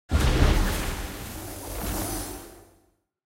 comic cast Magic

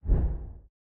Easter Egg Exit; Eggs

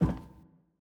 Footstep Metal

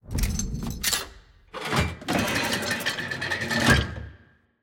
Lock Unlock Anim; Puzzle

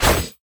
Attack Dash; bloom minigame

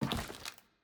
Footstep Glass

